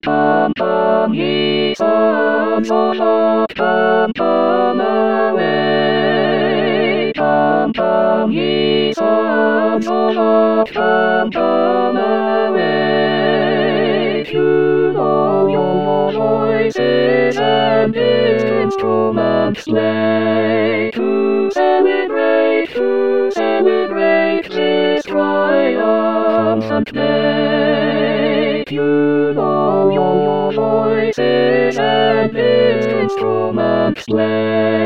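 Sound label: Tutti; purcell; ode 1694; come ye sons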